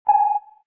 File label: tweet; tweetdeck